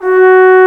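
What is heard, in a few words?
Trombone mf F#3; Digital Sound Factory Virtuoso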